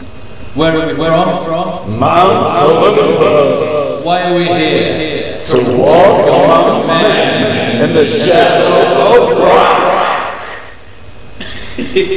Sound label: band